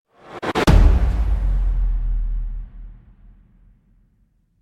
Short impact; کوتاه ضربه ای

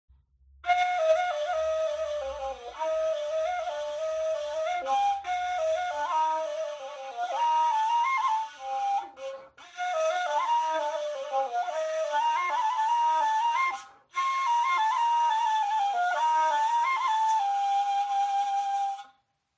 Kaval; کاوال

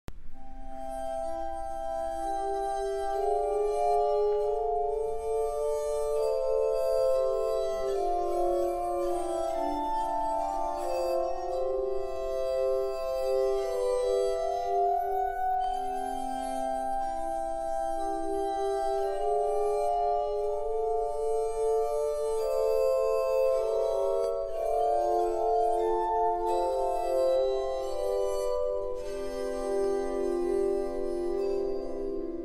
glass harmonica

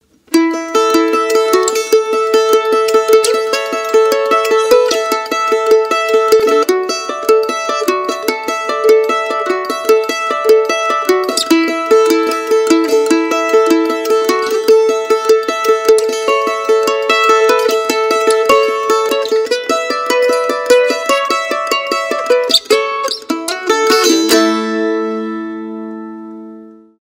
Mandolin

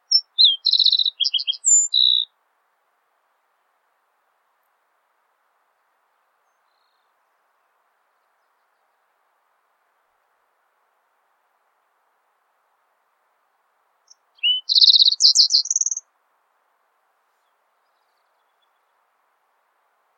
Green tailed towhee; پرنده آدم گریز دم سبز

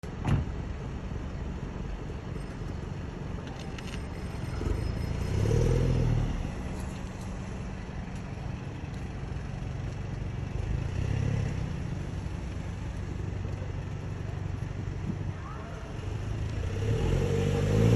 Cooper exhaust

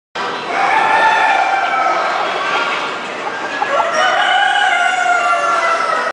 Cubalaya; کوبالایا

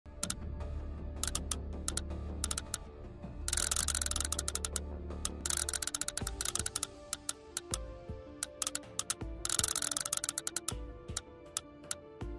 Russian Roulette

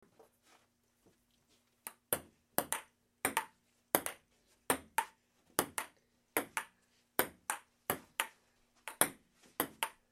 Ping pong

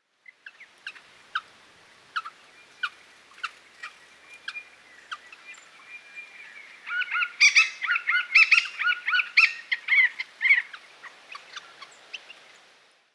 Hall's babbler

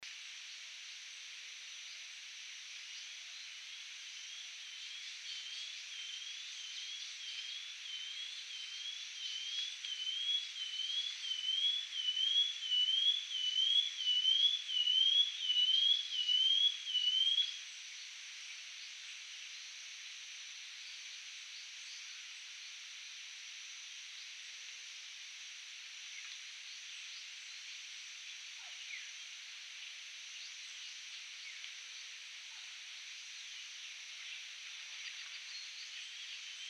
Brown headed greenlet; صدای پرنده سبزک سر قهوه ای

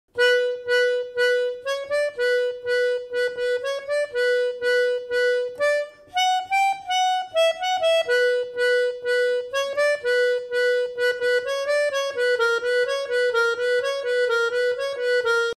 Melodica; ساز ملودیکا

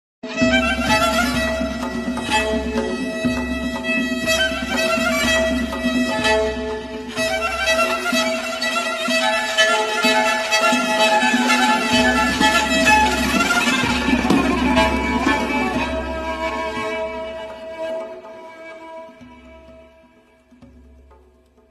Kamancheh Lori; کمانچه لری

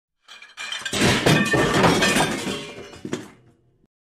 Break tile; شکستن سرامیک

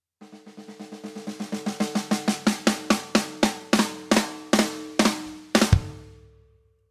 Snare Drum; اسنیر درام

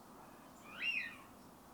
Eurasian wigeon; پرنده گیلار اوراسیایی

